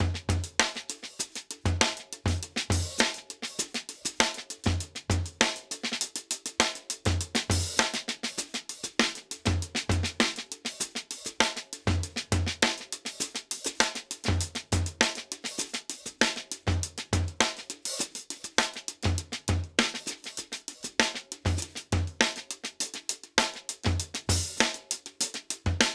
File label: Chill beat 100 bpm, drums; Basement drums vol 1